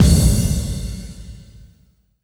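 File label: reverse bass drum, Drum & Bass; Ueberschall; Drum & Bass Resonance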